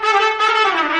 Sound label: trumpet danger; music